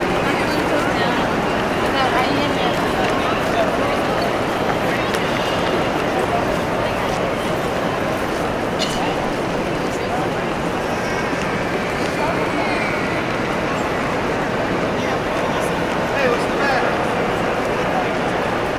ambience train station grand central concourse nyc; sound effects one